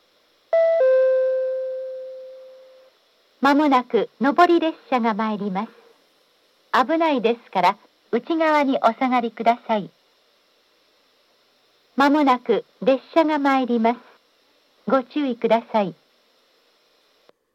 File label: higashi yamakita, station; jr central